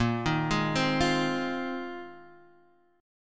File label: A sharp 9th Suspended 4th, chord